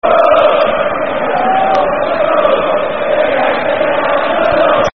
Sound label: Chelsea Chant; Tiago Wooah